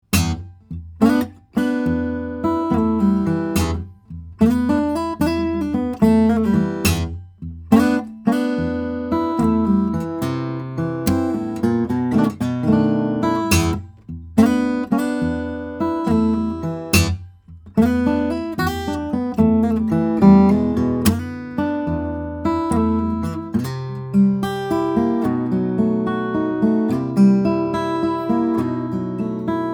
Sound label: guitars; Henderson 000 12 fret; mahogany; spruce; flattop